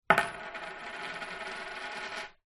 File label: coin down on surface